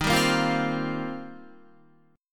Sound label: Eb 6th Add 9th, Guitar; 10,10,10,10,12,13; Eb6add9 strummed